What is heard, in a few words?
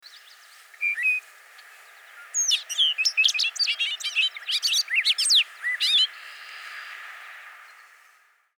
Bobolink song